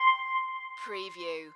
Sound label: musical